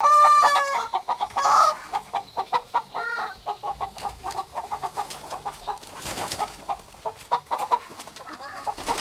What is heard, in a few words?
agitated chickens clucking and moving; birds